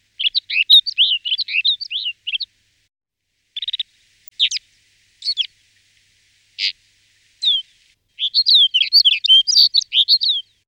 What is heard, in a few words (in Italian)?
zigolo delle nevi Plectrophenax nivalis; Versi Animali; Il Forum della Fotografia Naturalistica